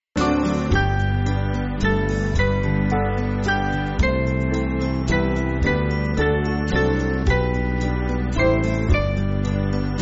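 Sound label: Band, Backing Files for Churches; The Day Thou Gavest; Radford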